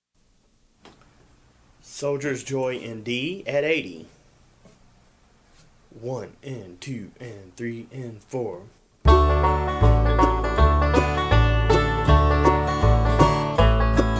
@ 80 bpm; Soldiers Joy

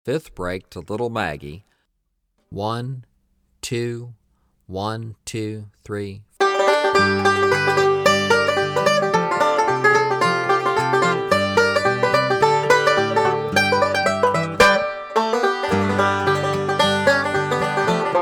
110 Bpm

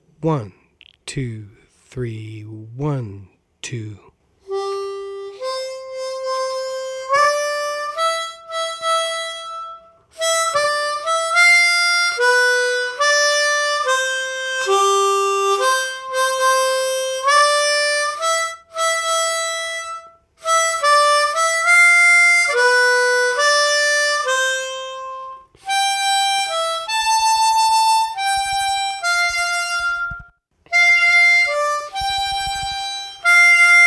Slow; O Christmas Tree

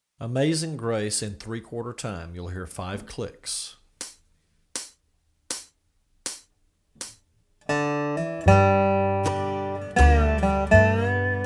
Fast

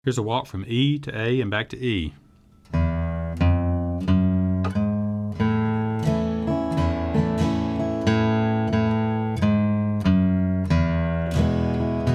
Bass Walk Exercises

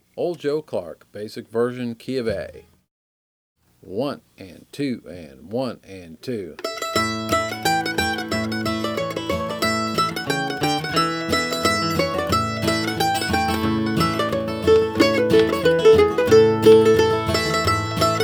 Basic; Old Joe Clark

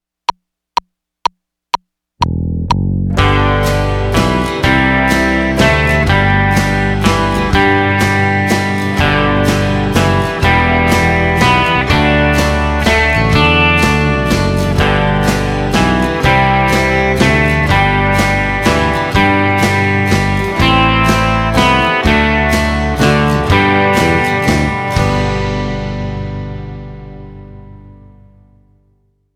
Waltz In G

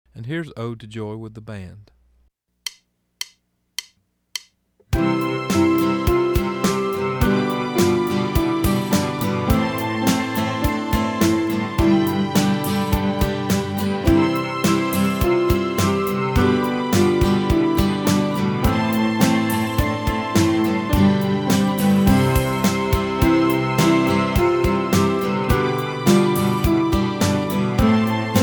Grand Staff Fast

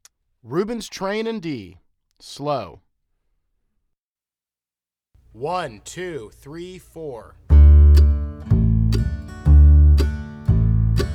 Ruebens Train D Slow

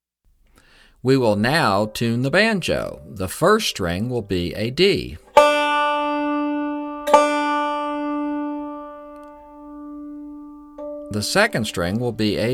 Tuning

